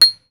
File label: Hammering metal